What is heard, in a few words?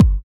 BD ROOM; Best Service ProSamples vol.54; AKAI